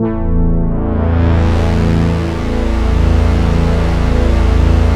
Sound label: MXWALL, MATRIX 12, Vintage Keyboards; Big Fish Audio - First Call Vintage Keyboards